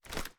ads up, reloads; gmod; iw8

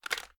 reload empty lift; gmod

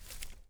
plant hang; gmod

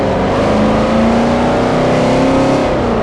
third, vehicles; gmod; tdmcars; van

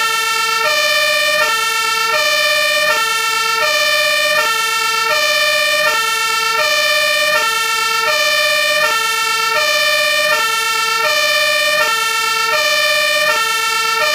german firetruck, siren; gmod; svmod

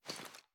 sm ads down; gmod

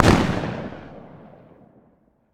shotgun fire atmo ext; gmod; viper

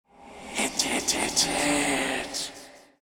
eyelander idle; gmod; durgz; meth

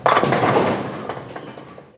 pin hit; gmod; perp2.5

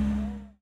stop, vehicles; nismor35

